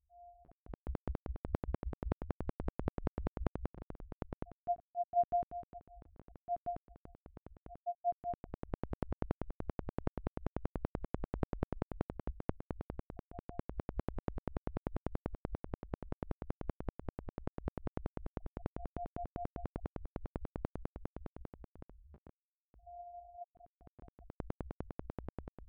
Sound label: parkinson, tremor; translation rotation